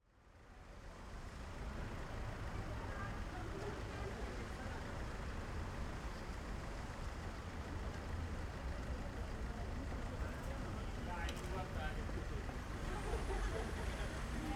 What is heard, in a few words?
Rome Late Night Traffic; Sculptunes; Rome - Urban City Surround